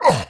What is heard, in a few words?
jump; quake2; quakeguy